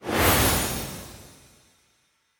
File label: magic swoosh